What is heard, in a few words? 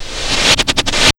TECH 140 FX, Big Beat Drumloops